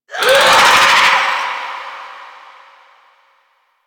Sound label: Infested Scare Vocal; Ambience; Warframe; Corpus Infested